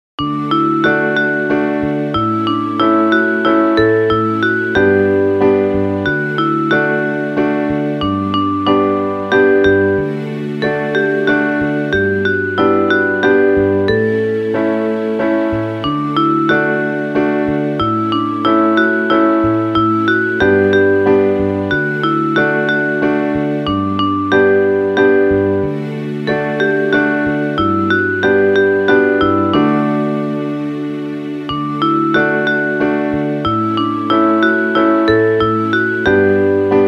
Piano Ensemble

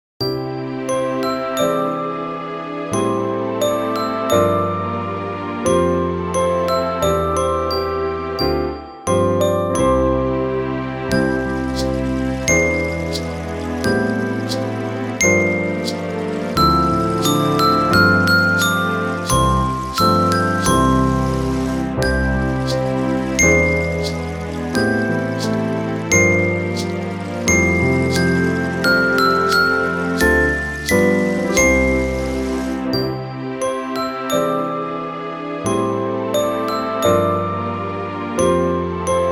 chime bars with accompaniment; Praying Song

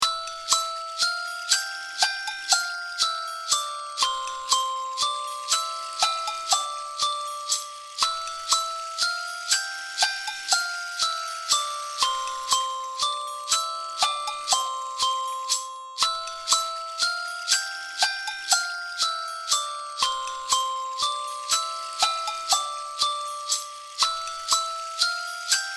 CHIME BARS ONLY; Ode To Joy

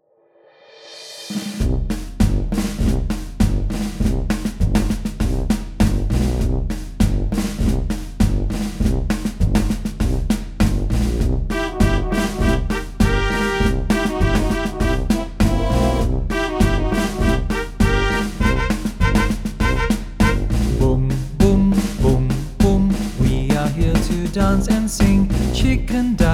Singing The Animals Are Singing Slow

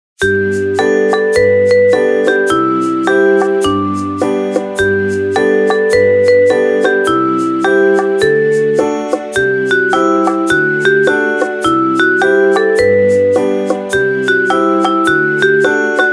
Full Ensemble

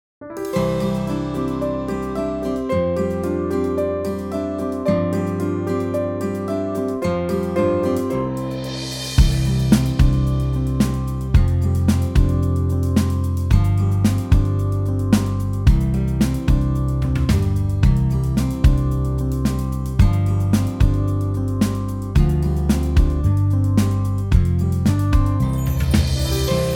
Good Morning 111bpm

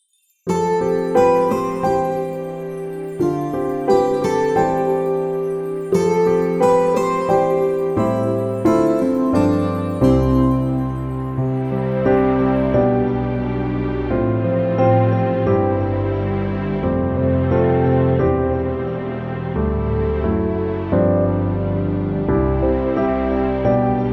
88bpm; Beautiful Star

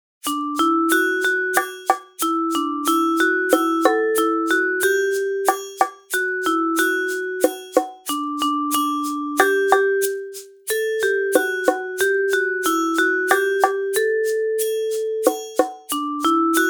Percussion Ensemble

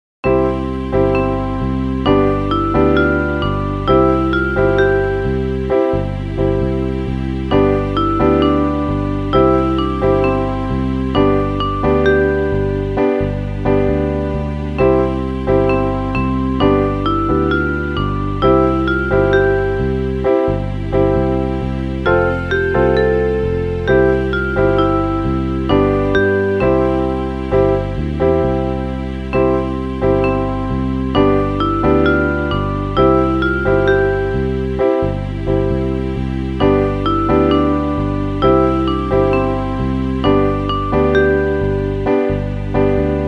Piano Ensemble

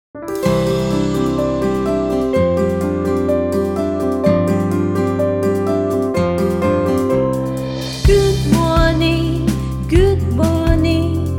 Singing Good Morning Fast

